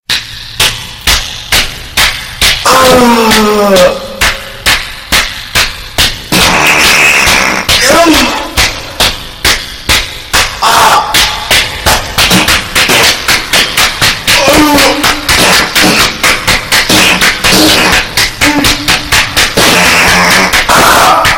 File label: real ass clapping